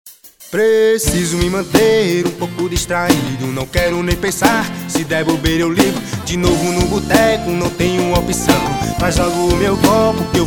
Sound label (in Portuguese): forro